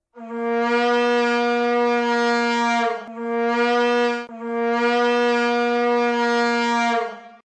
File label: vuvuzela